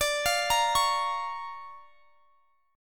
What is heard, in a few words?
D Minor Major 7th Sharp 5th, D, down; DmM7#5; arpeggiated